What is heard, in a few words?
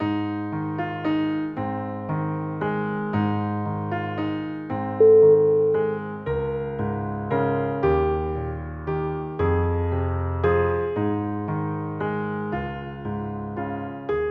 silent night solo piano